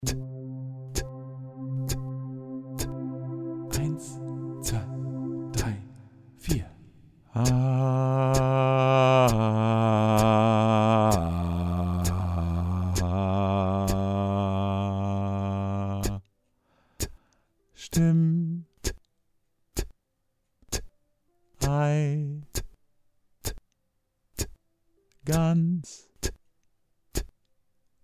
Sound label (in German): Jede Stimme Bass Solo